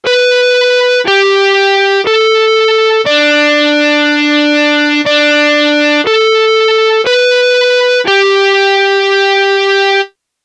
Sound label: distortion